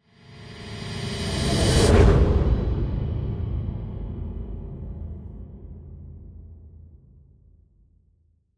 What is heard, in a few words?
Skybox Warp Sequence Lead-In Only